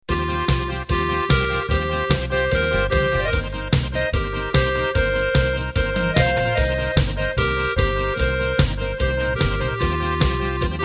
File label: Polka Bavarian intro